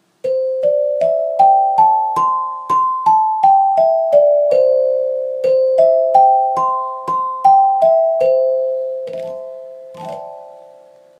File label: Aluminium